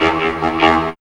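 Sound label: synth riff; E-Lab; X Static Goldmine 1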